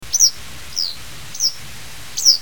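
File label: wagtail; sekirei; toritori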